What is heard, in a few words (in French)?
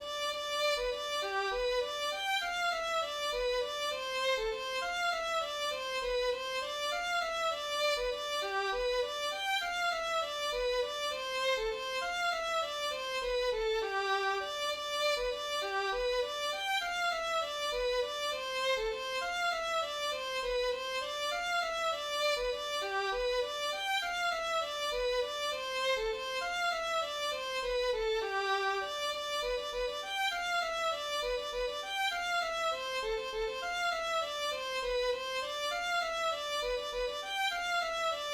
valse